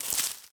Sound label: weed step; Golden Crayon Sounds